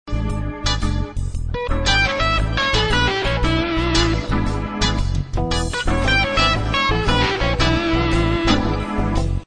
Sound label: blues